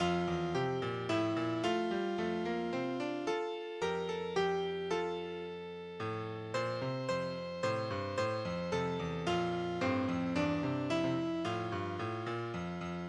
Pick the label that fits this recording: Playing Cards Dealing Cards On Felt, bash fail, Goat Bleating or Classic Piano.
Classic Piano